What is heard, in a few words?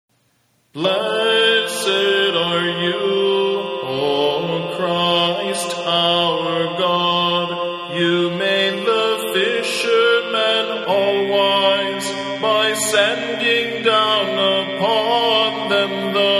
Hymn of Pentecost